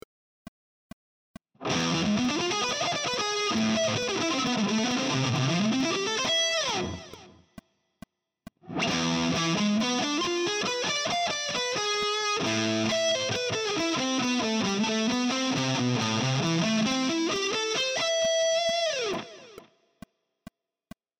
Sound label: harmonic minor